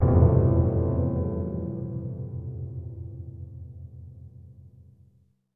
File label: Cacophony; Hell House; traverse starlight and prairie; snes